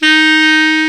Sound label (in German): Klarinett; Alpine Volksmusik